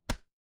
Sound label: impact slam